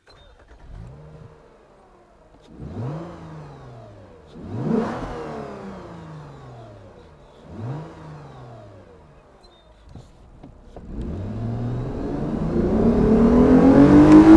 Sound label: Lexus GS350 F Sport